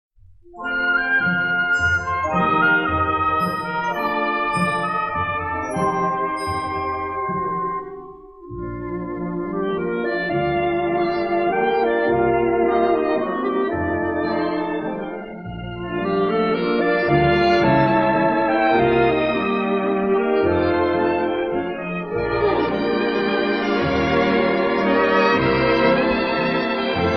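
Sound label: estradnyiy orkestr, variety orchestra; Это стилизация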